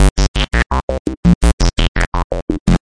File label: a bit of bubbly bass; BASS LOOPS